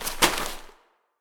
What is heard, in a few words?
Prisoner Page Turn; Prison Architect